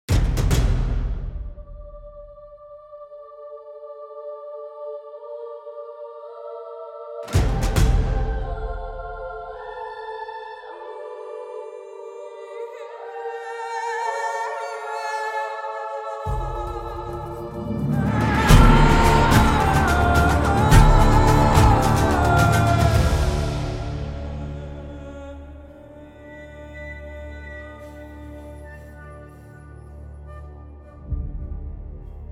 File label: Trailer Music; sintel